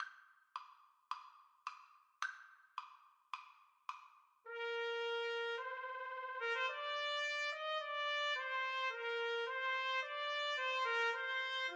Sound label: trumpet voluntary